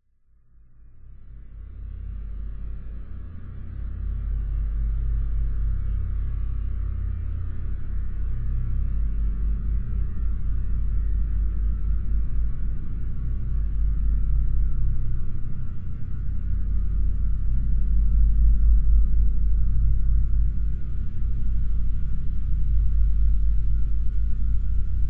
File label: science fiction drone atmosphere desolate spacious eerie; zapsplat